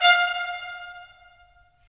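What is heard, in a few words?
brass trumpets staccato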